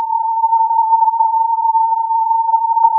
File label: filtered input